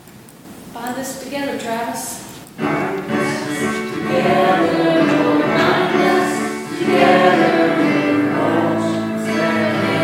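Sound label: Closing Chorus and Benediction, church service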